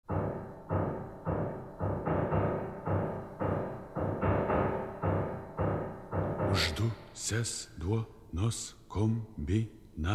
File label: vocal cycle; bari